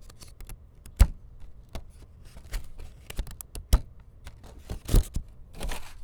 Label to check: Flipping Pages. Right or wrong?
Right